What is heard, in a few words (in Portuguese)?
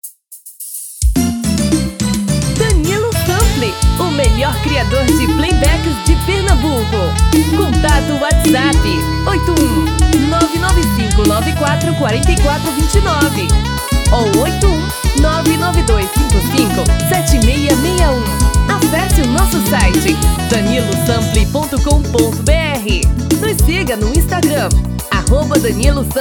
Forro das Antigas